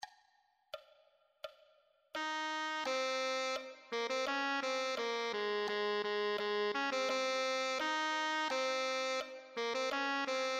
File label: tenor saxophone